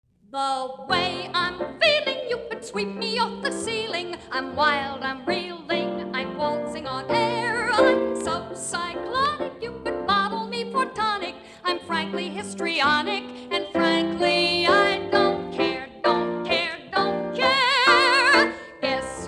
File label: guess who vocal